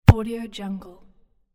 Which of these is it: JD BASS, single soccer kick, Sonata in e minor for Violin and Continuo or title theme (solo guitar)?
single soccer kick